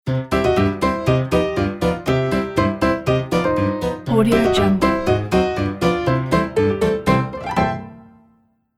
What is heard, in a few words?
old style piano ident; logotunes